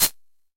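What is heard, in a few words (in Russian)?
звук шейкера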